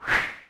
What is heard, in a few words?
sugar rush